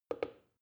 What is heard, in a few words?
plastic button start click soft fast